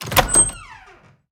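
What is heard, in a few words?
doors wood single light open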